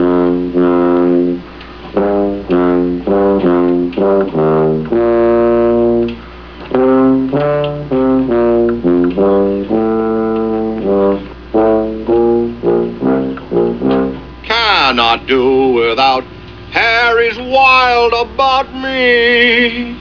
TUBA; (Plays the tuba and sings)

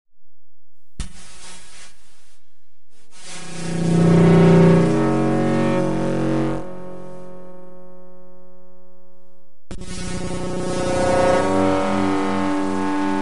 very low flute wet; cyberwhistle

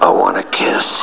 A kiss

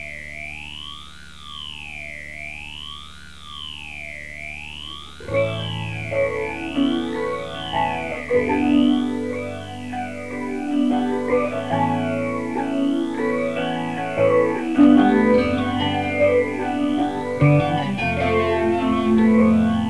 Phaser

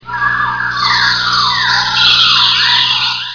just birds

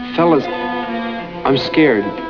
scared

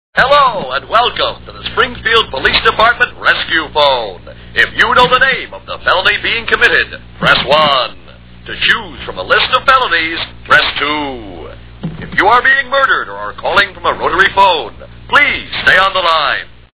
police

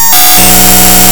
synth broken; command-driven output; horrible as there were still so many bugs; noise

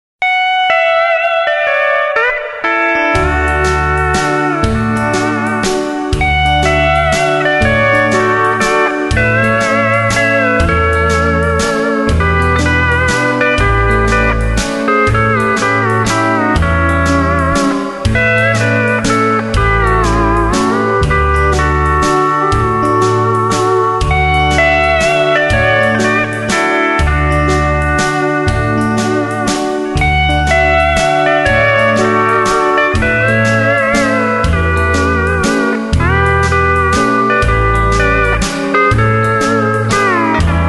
Waltz

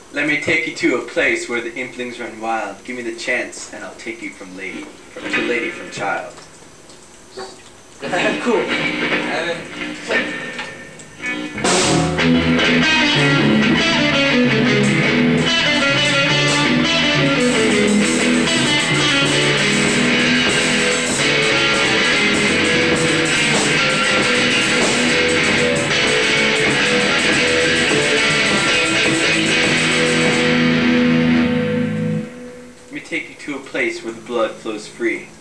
RIFF

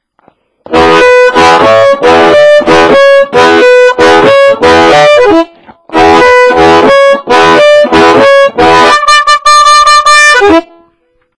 harmonica

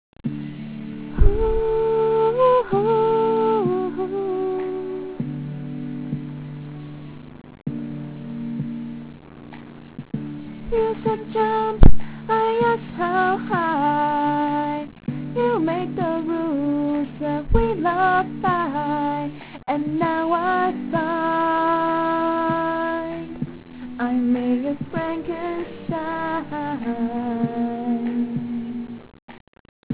karaoke

